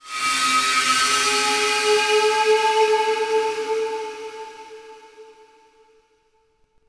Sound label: ice; DAoC